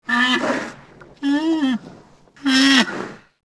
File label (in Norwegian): neshorn; dyr